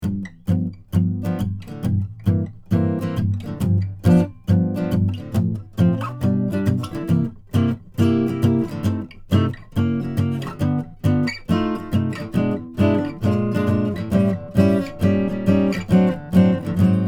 Jazz; SANTA CRUZ OM/PW AC. GUITAR; CARDIOID; AKG C414EB; Rosetta 200 A/D converter. No EQ or effects.

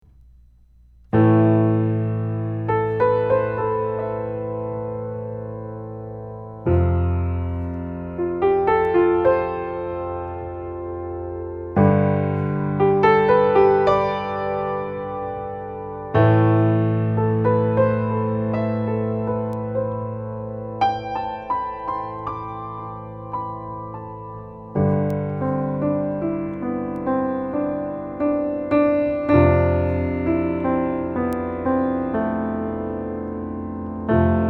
Piano, UM25; AMI UM25Proto

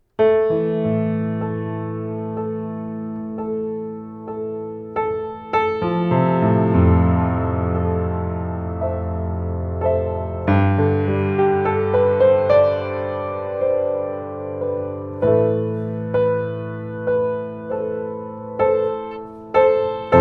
Piano

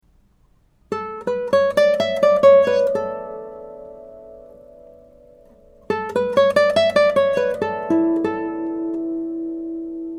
Resonance; schoeps cm61

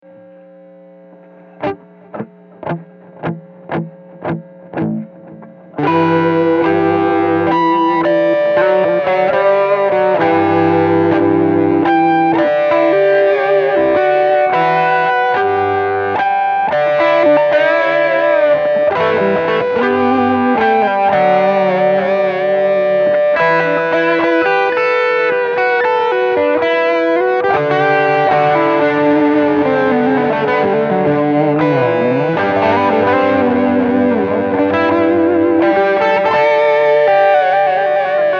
Duet; Epi Casino

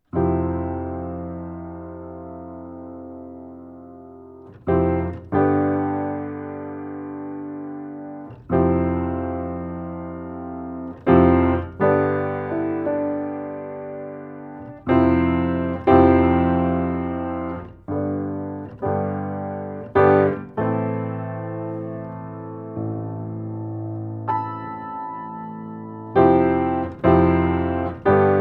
Piano; AMI; Schoeps CMT541

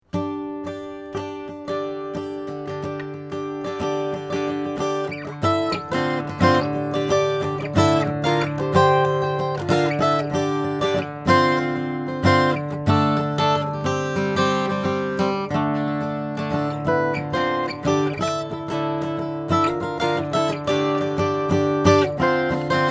Strum D5; Neumann KM253

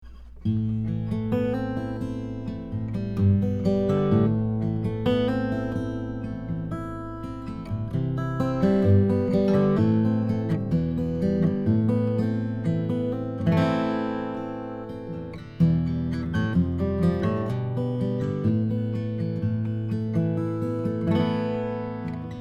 Fingerpick; AMI UM17R

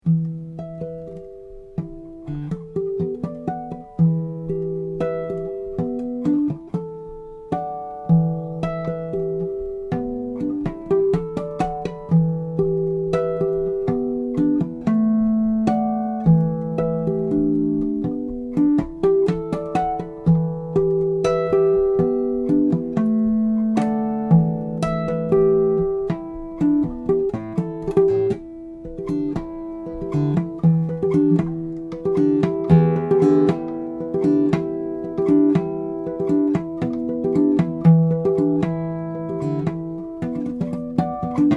harmonics; Czobel

